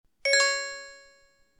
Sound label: not crystal, zvuki telefona blackberry bold 9700